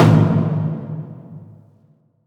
Taiko Drum; Warframe; Dojo Props; Taiko Drums

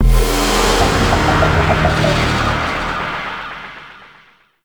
Void Dragon Ring Correct; Enemies; Gameplay; Warframe; Duviri

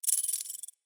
companion collar jingle; Pets; Zanuka

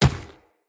Infested Medium Run Dirt, Footsteps

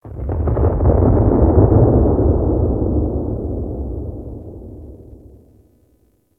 Ice Distant Ice Break Large, Ice Planet; Warframe; Corpus Ice Planet; Ambience

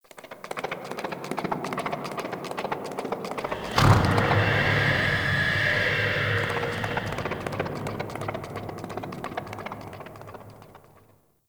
ghost horse; Ambience; Dagath; Warframe; Clan Dojo

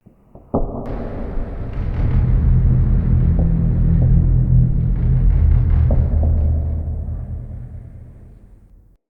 Root Cave Low Creaks; Ambience; Neathuns Root Cave

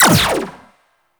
Energy Rifle Mech; Weapons

